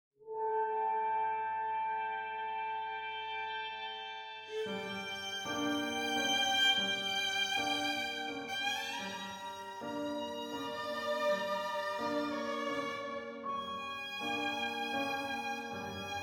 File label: music Nightmare Violin Loop